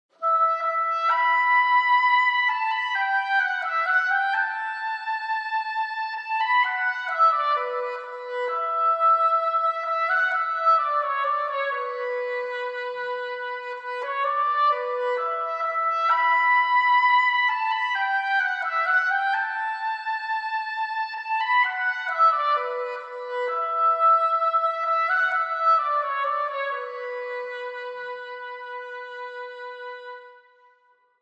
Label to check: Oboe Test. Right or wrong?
Right